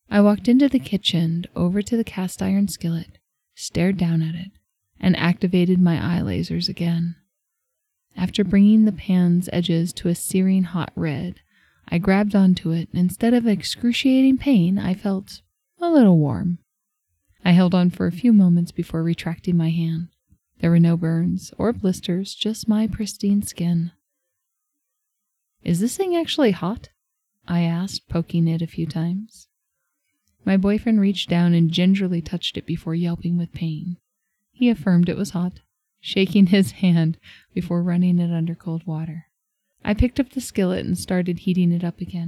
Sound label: Sample Bass Only